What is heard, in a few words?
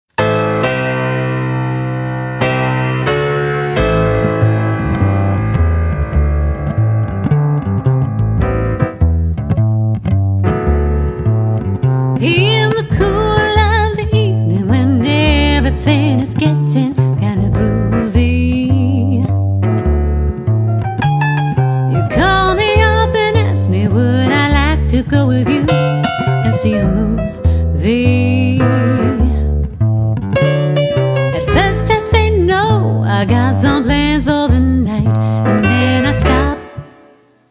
Spooky; Curio Club